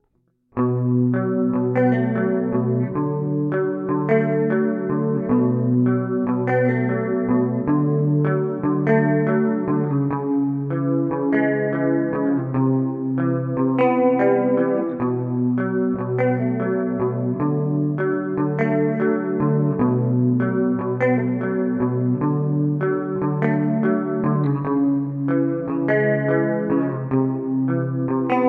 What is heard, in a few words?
Immerse MKII Detune